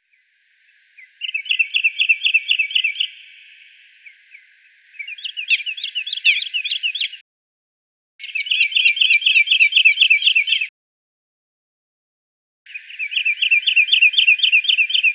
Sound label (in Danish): Tinksmed; Biodiversitet